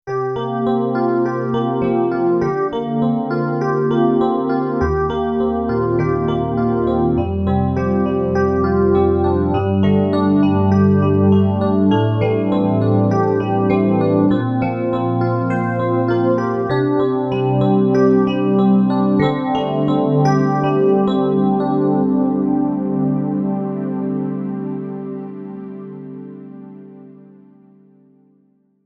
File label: Music Cue Mix Elec Piano; music samples for mary katzke lymphodema film